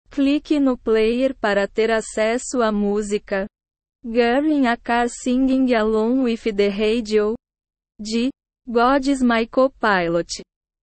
girl in a car singing along with the radio

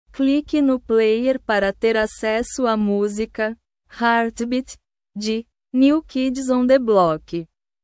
heartbeat

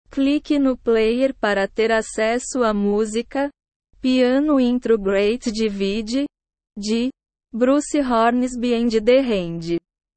piano intro

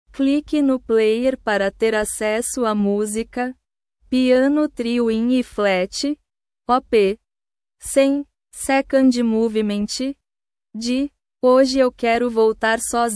piano trio in e flat second movement

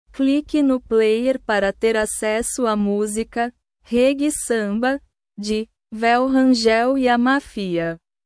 reggae samba

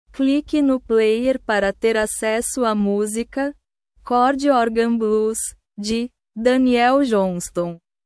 chord organ blues

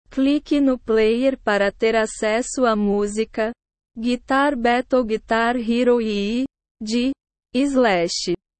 guitar battle; guitar hero iii

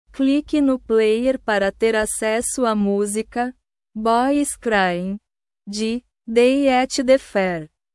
boy is crying

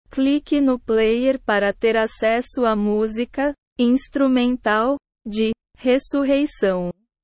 instrumental

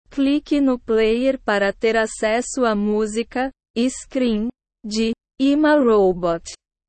scream

